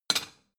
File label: plate small shelve